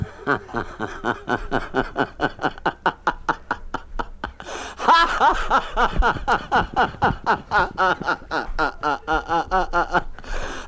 laugh

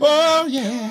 "oh yeah" SUNG

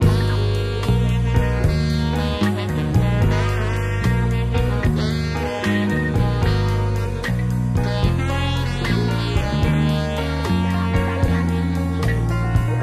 sax; Phonky

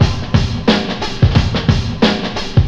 Drum loops Boombap

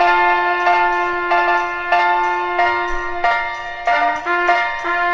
horns piano, Phonky loops

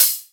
Real Closed Hats

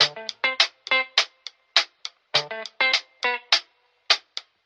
guitar, Loops Phonky

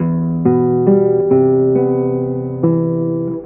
bass pistola, Loops, Sad

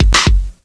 rims, Drum loops, Kick Snare

